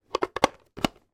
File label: household food mixer hand electric whisk attachment clip in; zapsplat